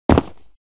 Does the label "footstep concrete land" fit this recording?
Yes